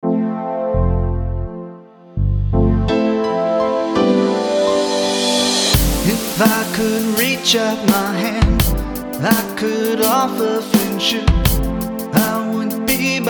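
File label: Piano and Strings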